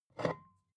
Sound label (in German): bottle vodka pick up slide, gastronomie